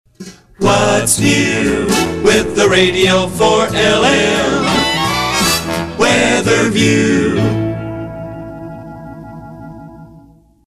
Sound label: Weather View; 4LM; PAMS